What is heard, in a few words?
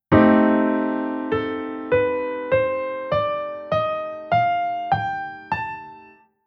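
Chord Scale Minor Melodic Minor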